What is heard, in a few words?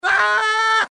DIE, flesh n scream; hl2dm; oppressive territory